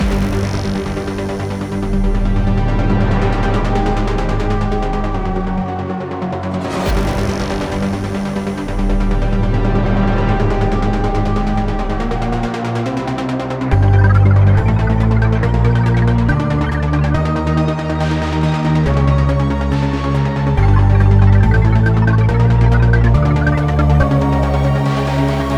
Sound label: music combat high; Spacebase Startopia